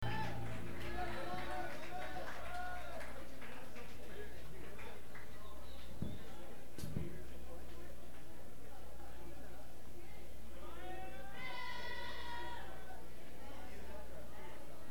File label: Tuning; Burlington, VT; The Front